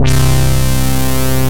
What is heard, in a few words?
Pocket Rocket, Bass; Basses; Ableton; Creative Extensions